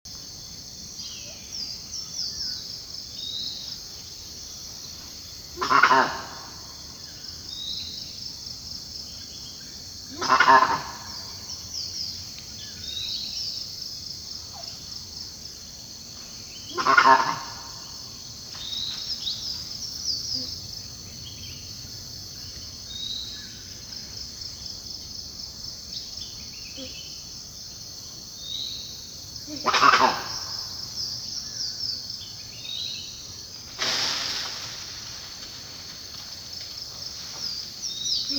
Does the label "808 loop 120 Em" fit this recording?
No